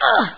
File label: "ugh" female; Palm OS; EDGE